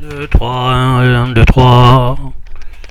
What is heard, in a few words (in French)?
test, orgue de barbarie